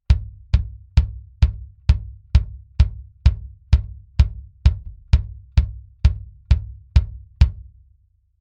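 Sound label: bass drum Mic Test RE27ND Mix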